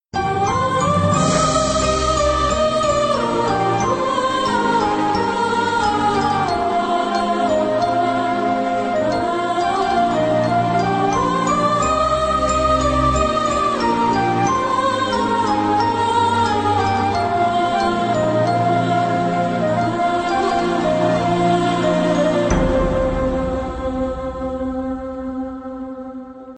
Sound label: bgm